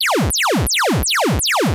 pew pew, Arcade; Machines

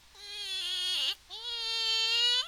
fox; Animals

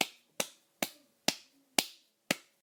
clap, emotes

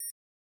periodic beep

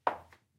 heels clack, Footsteps

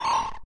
beep, Machines

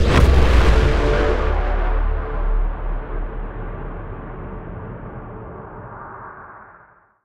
singularity collapse

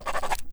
paper scribble

